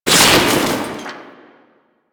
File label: Debris Fabric; Warframe; Ambience; Entrati